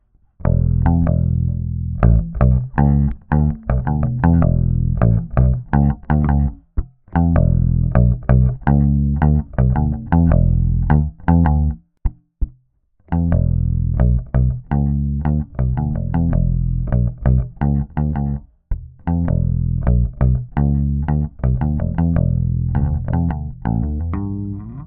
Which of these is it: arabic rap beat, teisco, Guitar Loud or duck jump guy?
teisco